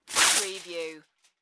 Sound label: Tin Foil Tear Sheet